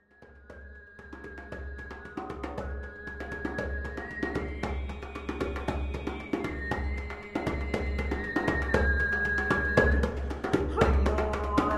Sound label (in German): Djembe Gesang; Djembe (Trommel) mit OT-Gesang; Djembe (Trommel) mit Obertongesang (Improvisation); Lieder für verstorbene Erwachsene